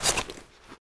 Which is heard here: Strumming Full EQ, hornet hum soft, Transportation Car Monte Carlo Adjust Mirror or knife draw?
knife draw